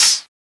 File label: Open Hat; FLPRO